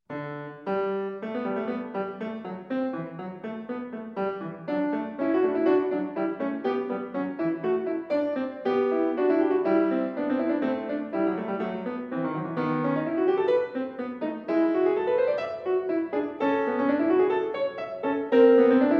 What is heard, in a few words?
Alla Fuga, Piano Left Hand; Saint-Saëns, Camille (1835-1921); 1912 Op.135 6 Etudes For Piano Left Hand